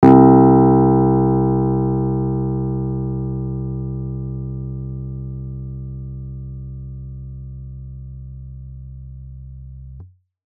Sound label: C2 minimal, pianos; Modelling sound generation in pianos